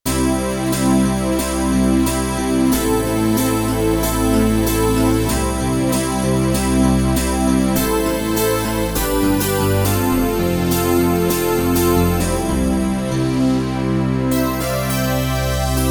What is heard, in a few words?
Roland JD-800; Stack Attack!; Factory Patches